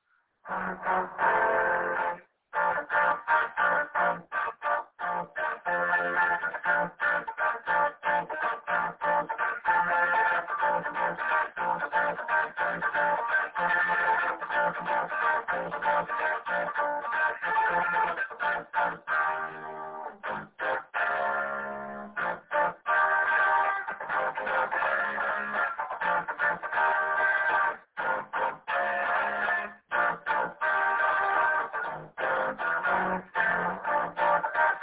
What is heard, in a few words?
punk like